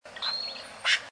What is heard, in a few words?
Giant cowbird; پرنده گاو مرغ بزرگ